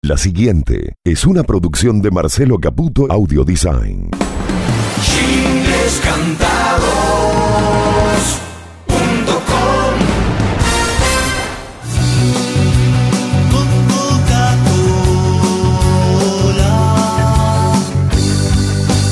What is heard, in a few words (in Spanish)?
jingles masculino